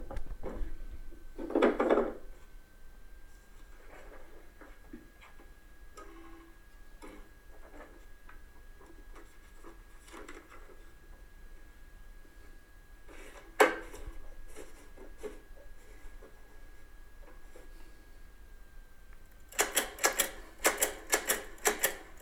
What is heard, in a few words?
Typewriter